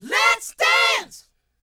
A#C, Vocal, DANCE 120; ILIO; Vocal Planet VOL-4; R&B & Dance

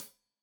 Hat Tight, Hi-Hat, Cymbals; Deeply Sampled Drums

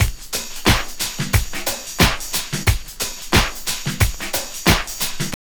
TRIP 90, trip loop

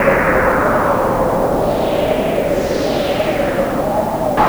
Gale, Environments; Sonic Foundry (Sony Creative Software); Myths of Technology

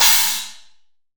MUT, CYMBAL

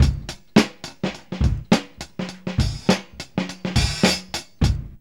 FUNK BOMB; Zero-G; Funky Elements

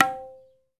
TABLA TONE, Indian Ensemble

